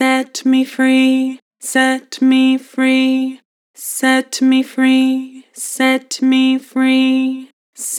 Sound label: Set me free, Harmor Vocals, Flat